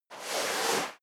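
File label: step dress boots sand slide long, footsteps; schritte; menschen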